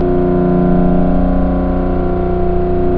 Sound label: Generator Drone